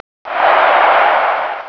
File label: CROWD